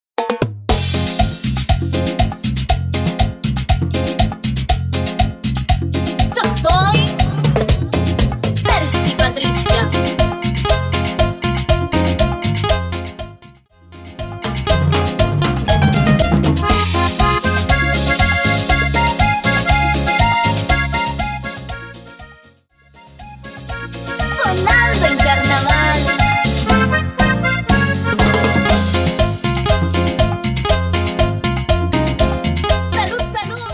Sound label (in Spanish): Intro Melody 120 Bpm